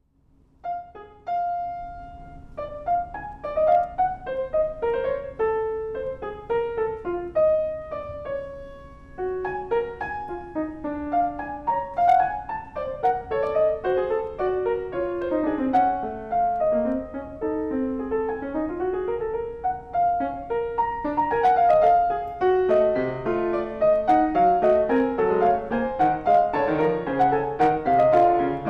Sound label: Piano Partita Fugue